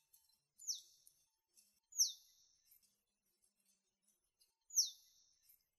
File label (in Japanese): oojurin jinaki